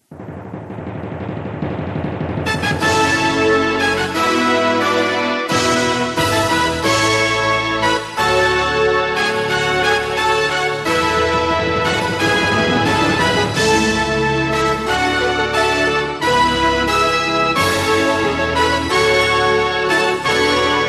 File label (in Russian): fanfary dlya nachala prazdnika